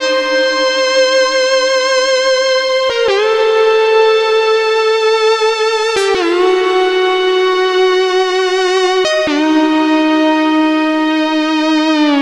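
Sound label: Lead Fusion, Drum & Bass Keys; Sonic Foundry (Sony Creative Software); Knutrix Drum & Bass; Keys Pads 1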